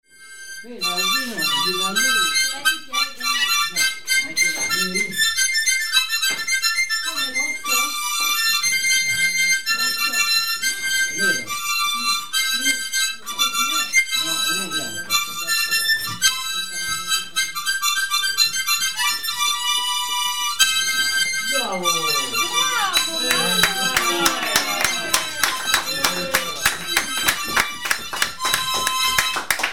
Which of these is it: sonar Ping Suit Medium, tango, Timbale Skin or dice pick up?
tango